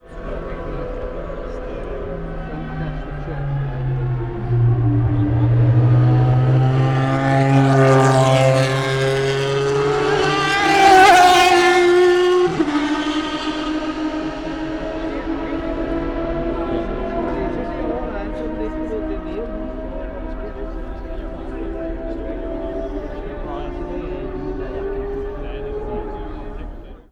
v8 v10 tertre rouge

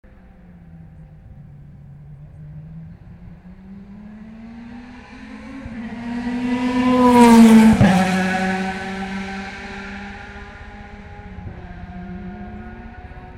Cars after Mulsanne Corner; Saturday afternoon

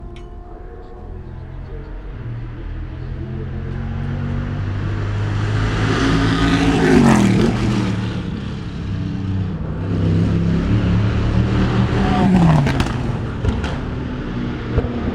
corvette and audi before tertre rouge sunday

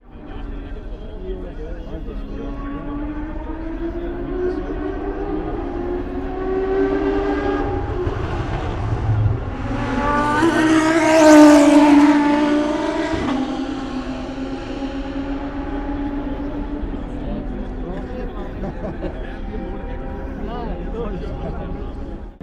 audi r8 tertre rouge

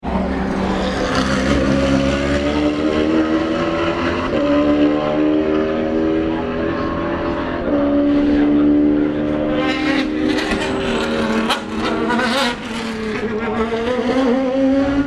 saleen accelerates

